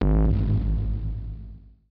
BASS SHOT HOUSE 125 A; eJay; Singleshots

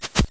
Pay Item; Treasure Galaxy (1994); Learning Company

